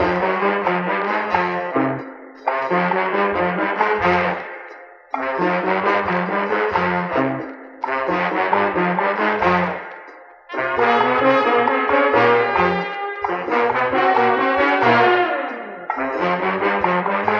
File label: horns, phonky loops